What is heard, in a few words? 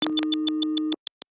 Bleeping Buttons; Digital Rain Lab